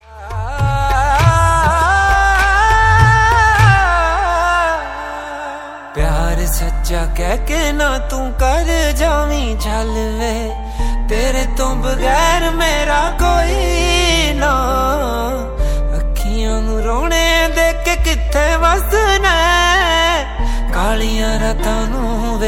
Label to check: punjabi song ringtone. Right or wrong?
Right